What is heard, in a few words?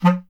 B. Clarinet